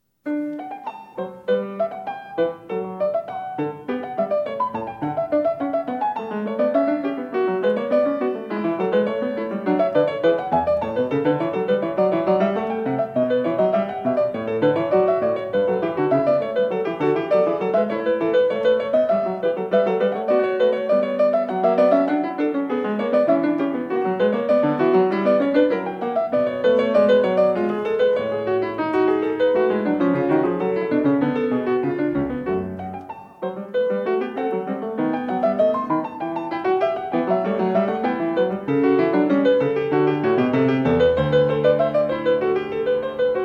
Sinfonia in D; BACH; SINFONIAS; BWV 789

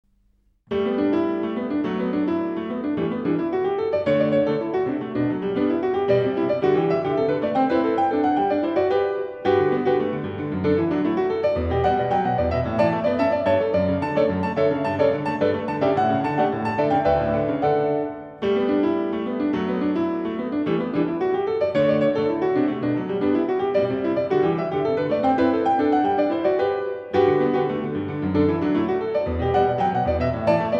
Solo piano movement; Jazz Var.4; BACH; GOLDBERG VARIATIONS

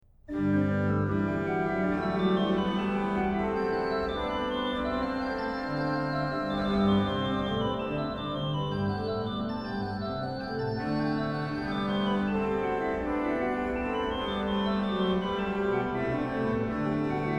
Gott ist mein Heil, mein Hilf und Trost, organ; BACH; BACH ORGAN WORKS; Chorale Preludes

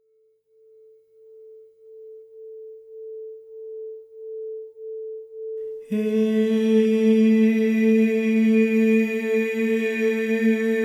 overtonal singing; Yoga and sound

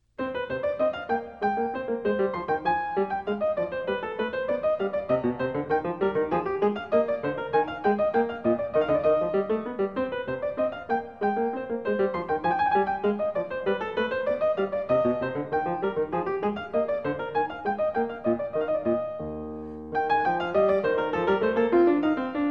air; French Suite 4; BACH; FRENCH SUITES

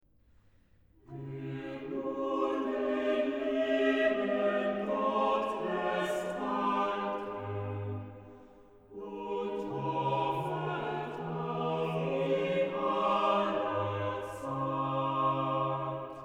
Chorale, ORGAN; BWV0093; BACH; CHORALE PRELUDES

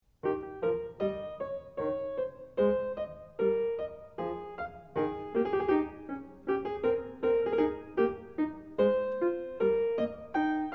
menuett; bwv 815a; French Suite 4; BACH; FRENCH SUITES

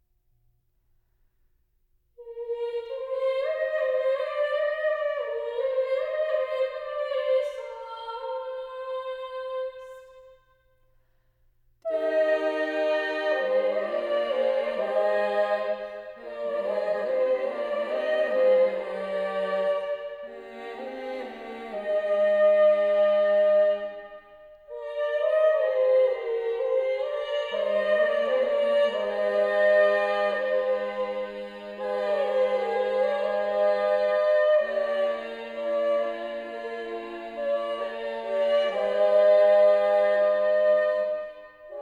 Chant Setting; Ite missa est; An English Ladymass

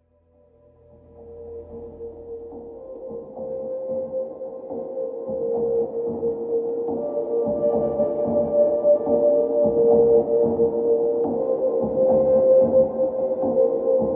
ambient

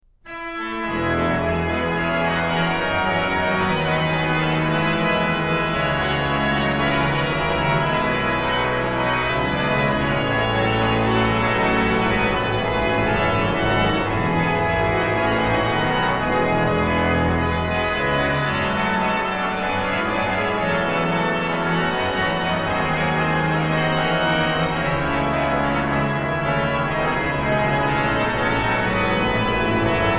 ORGAN, Chorale Preludes; BWV0602; Lob sei dem allmachtigen Gott; BACH; BACH ORGAN WORKS

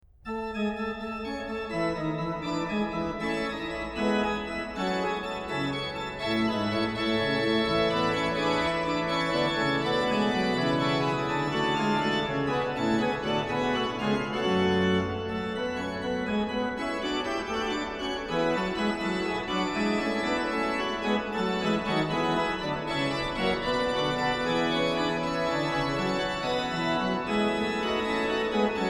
ORGAN, Chorale Preludes; BWV0712; In dich hab ich gehoffet, Herr; BACH; BACH ORGAN WORKS

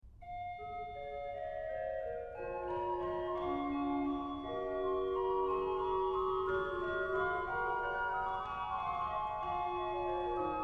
Christe, aller Welt Trost, organ; BWV 673; BACH; BACH ORGAN WORKS; CHORALE PRELUDES

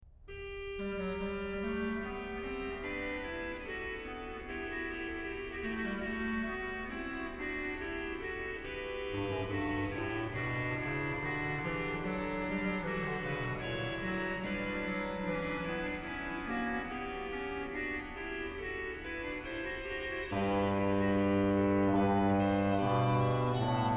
Fughetta, ORGAN; BWV0698; Fughetta super- Herr CHrist, der einig Gottes Sohn; BACH; BACH ORGAN WORKS; CHORALE PRELUDES

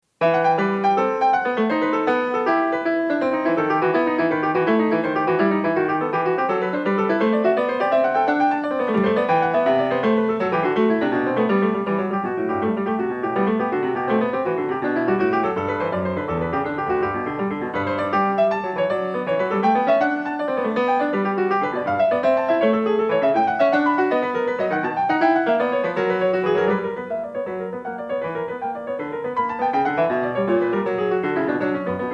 Tempo di Gavotta; Partita 6 in e; BWV830; BACH; PARTITAS

